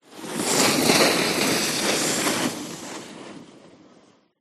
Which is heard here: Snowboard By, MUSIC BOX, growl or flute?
Snowboard By